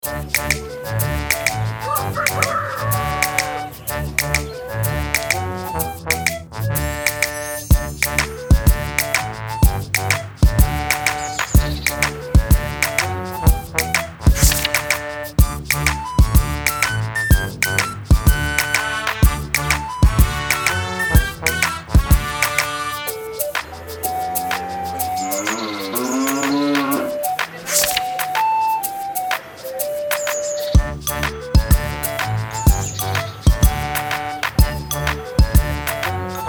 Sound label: INSTRUMENTAL ANIMALS